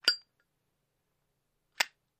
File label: Zippo Lighter Sequen; lighters & matches